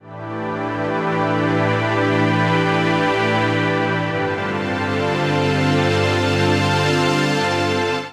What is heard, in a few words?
Pad (A) 118bpm; Music Loops; Gracious Fields; Ableton; Singularities